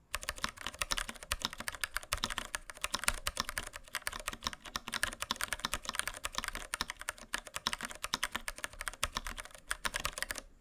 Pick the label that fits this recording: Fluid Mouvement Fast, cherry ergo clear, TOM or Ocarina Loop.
cherry ergo clear